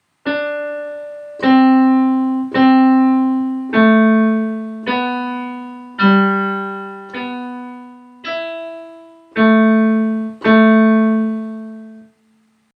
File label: Sequence of Pitches